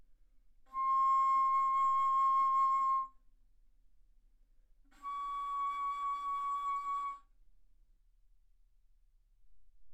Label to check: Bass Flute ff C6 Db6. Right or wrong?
Right